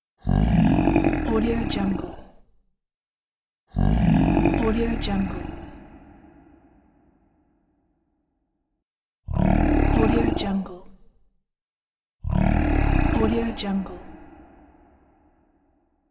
deep monster breath; moonaudioproduction

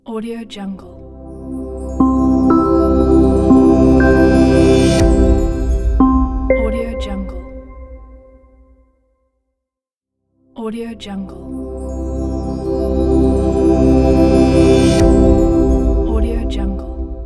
ambient logo opener

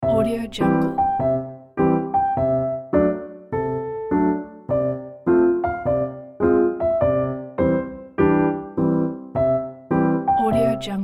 acoustic happy piano; sunsetproduction

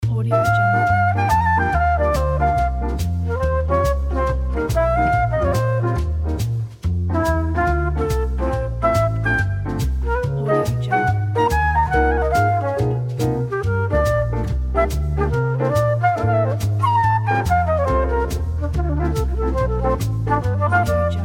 retro black tie party

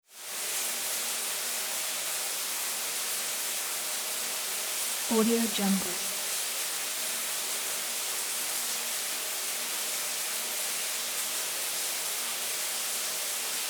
shower water running outside ambience

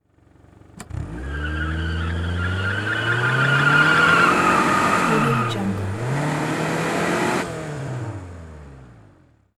automobile skid and squeal accent

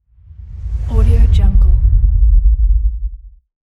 bass swoosh

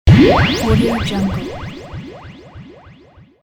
cartoon flying saucer

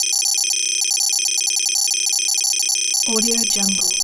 data readout; prosoundfx

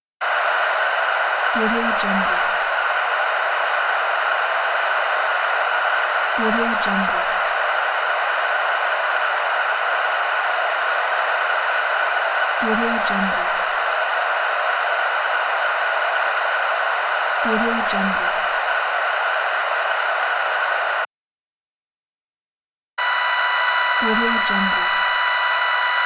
radio noise

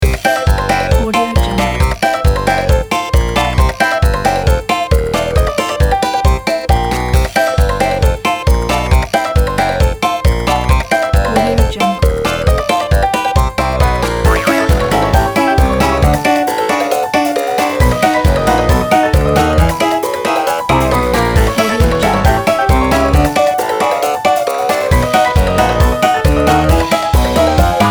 ragtime fun

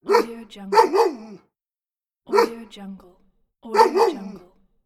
dog

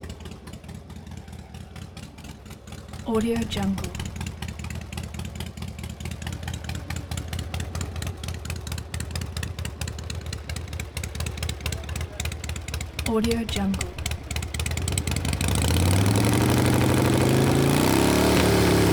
ext harley 64 pan; collanderson

